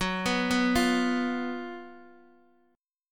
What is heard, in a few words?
F sharp Major 7th Suspended 4th F sharp, Guitar Open D; chordshape; down